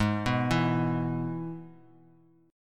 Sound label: Ab Minor Sharp 5th Ab; arpeggiated; x,11,9,9